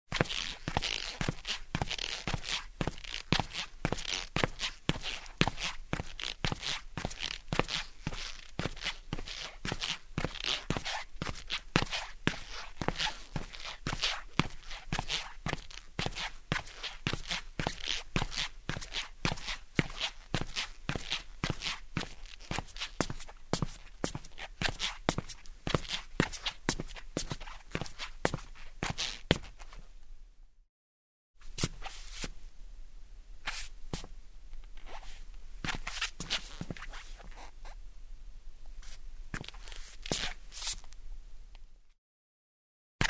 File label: Steps on the wood floor in shoes